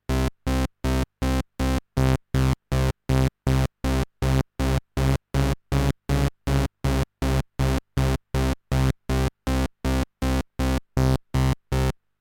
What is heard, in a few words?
Radias Detune